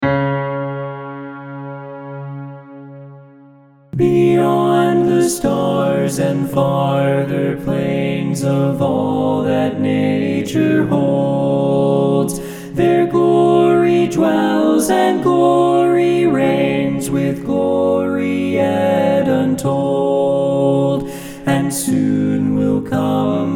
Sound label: ALTO